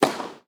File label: Tennis Serve